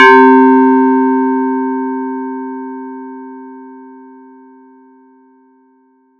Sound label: FX RND BEL; ModelCycles